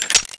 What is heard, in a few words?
draw